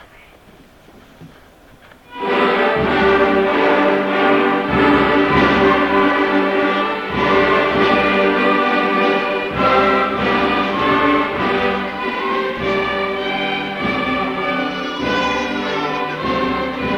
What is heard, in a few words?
Strings & Band Concert